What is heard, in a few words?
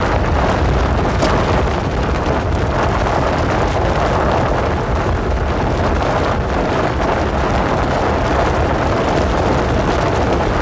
Car Accel On Gravel